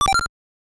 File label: pick up gem; ducktales